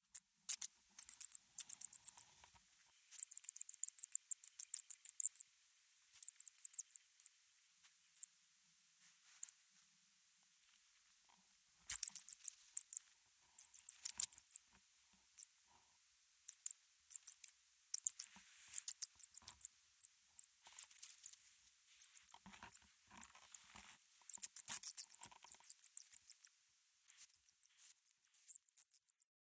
musaraigne

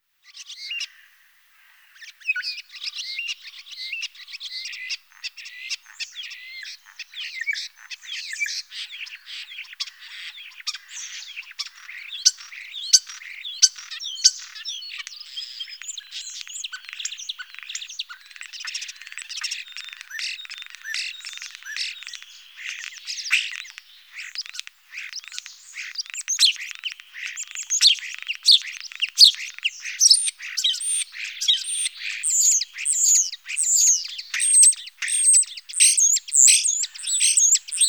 étourneau sansonnet

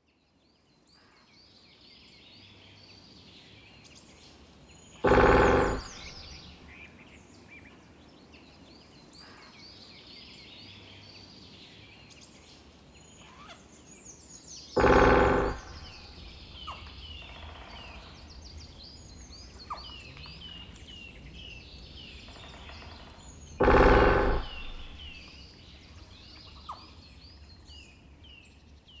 pic

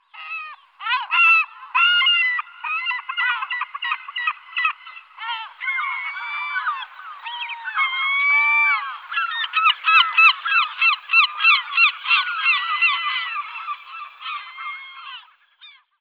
goeland leucophee

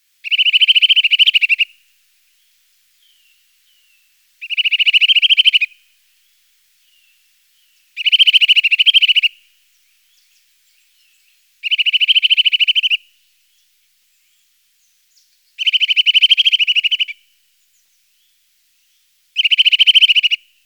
sittelle torchepot

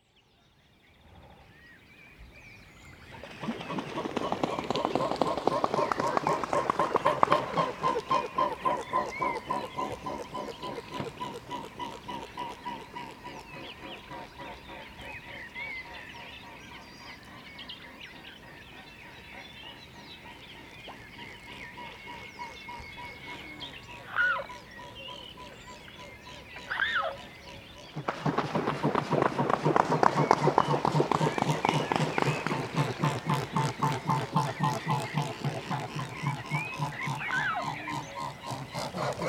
cygnes

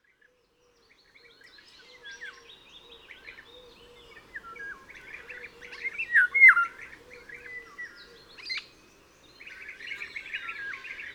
loriot

